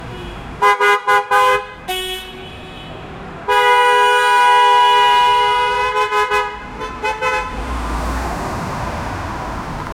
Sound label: traffic jam with lots of